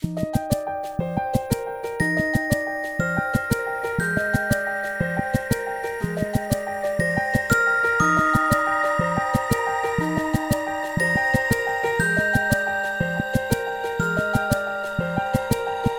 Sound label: Silence